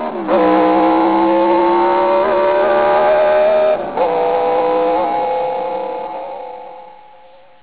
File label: guzzi v8